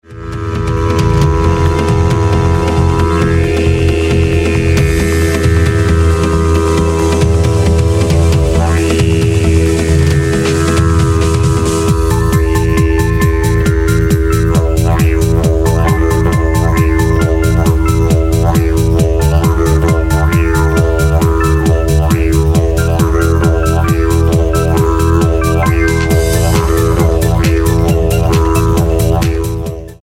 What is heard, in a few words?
Didge Disco